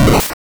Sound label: hit, attack sound; カタナ１